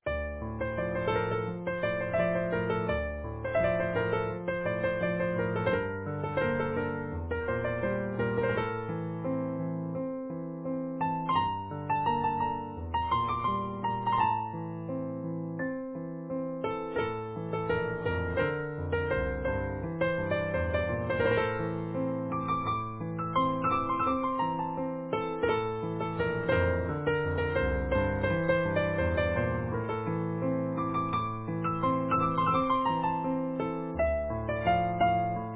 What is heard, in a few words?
Easy Piano